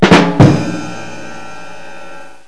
RIMSHOT; HUMOR

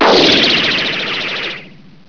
vibro hit

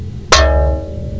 TIN GONG

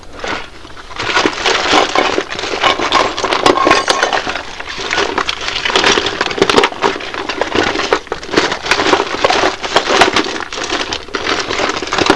digging

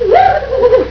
WOOB WOOB

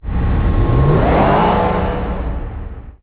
TAXI

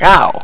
BIG OUCH; PEOPLE

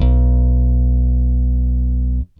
BASS, ROCK POP; MAGIX

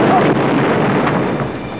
EXPLODE

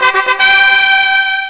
FANFA

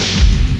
bang

